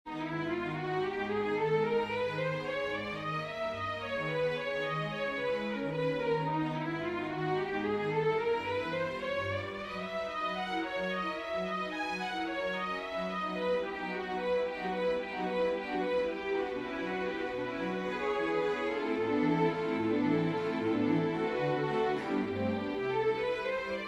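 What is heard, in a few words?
prélude, string quartet; chopin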